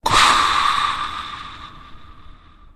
Chamber Decompressing, Sound of an air chamber decompressing.